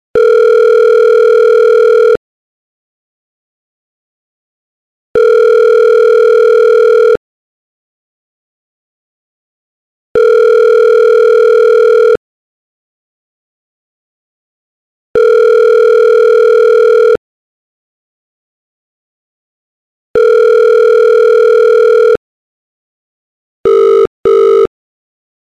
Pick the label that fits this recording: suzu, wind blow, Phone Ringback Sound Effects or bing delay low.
Phone Ringback Sound Effects